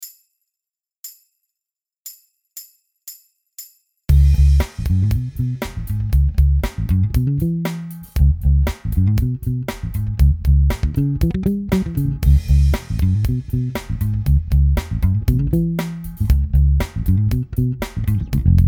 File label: R&B, bass